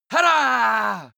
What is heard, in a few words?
male attack, schreie